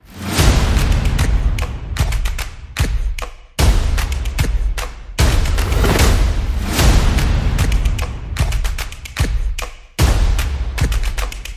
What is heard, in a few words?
Percussion and Effects